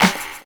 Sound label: SD, snare, 70 s Breakbeats